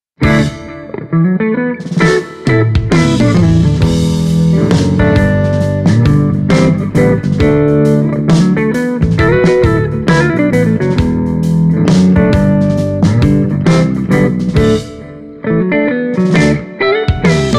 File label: Mini Rox Mic Inside G12H 75 Les Paul